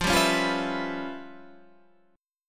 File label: Db 7th Sharp 9th Flat 5th F, chord shape